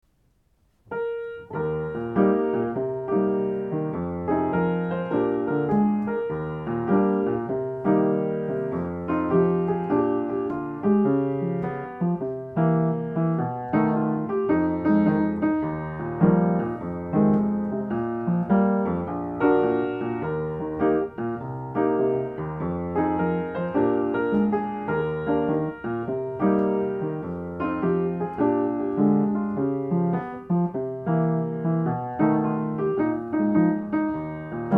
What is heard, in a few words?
Bar Piano